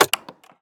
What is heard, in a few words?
switch button click ignition; bluezone; sonniss gdc